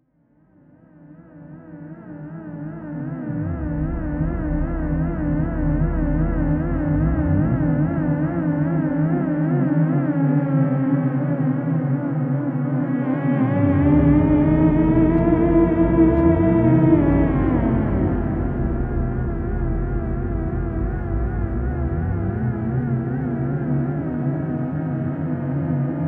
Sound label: Theremin, Scary Sound Effects